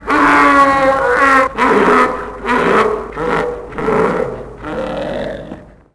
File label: howl echo; tusken; Star Wars Obi-Wan; XBOX